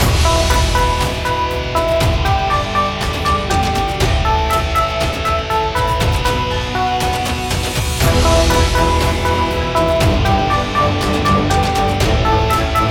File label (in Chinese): 登录